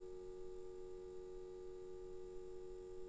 buzz loop

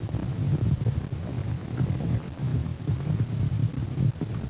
fire big

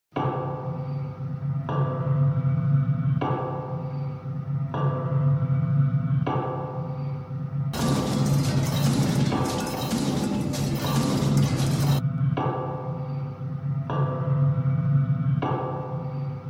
ambient; mystic radar

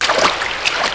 death splash

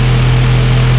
Fan Large

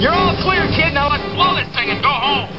death star

